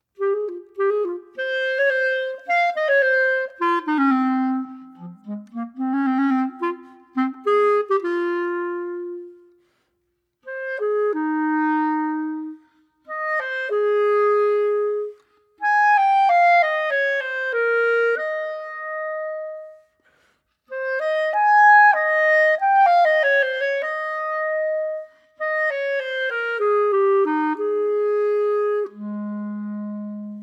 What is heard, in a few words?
clarinet